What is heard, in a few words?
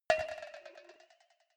cowbell move muted soft